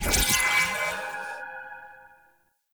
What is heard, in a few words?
Mirror Portal Beacon Stinger; Warframe; Duviri Murmur